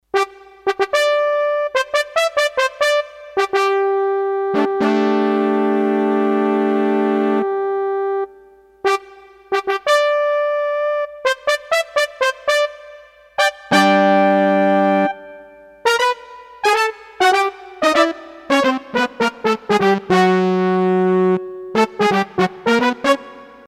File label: Trumpet Section, Roland D-10; Factory Patches